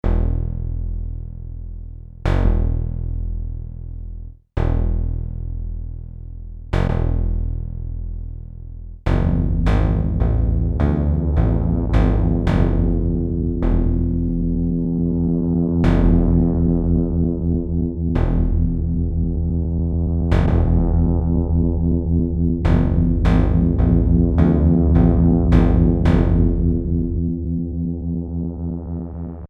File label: basses can be scary